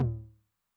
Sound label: Med Tune Long Decay, 909, MID TOM; classic machines; 909mod; MT - MID TOM